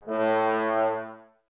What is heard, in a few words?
Brass, Woodwind Brass Ensemble